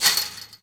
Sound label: Fence Chain Rattle; Foley - Rattles